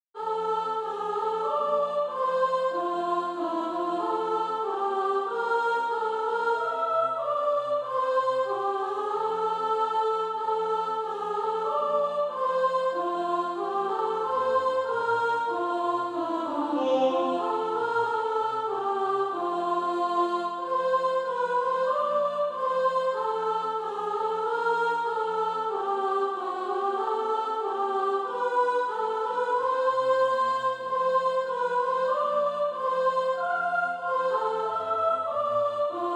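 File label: soprano, Practise Music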